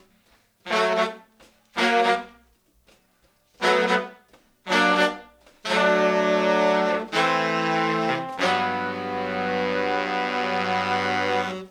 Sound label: Soul Horns, 70s Analog Funk; Sonic Foundry (Sony Creative Software); What it Is!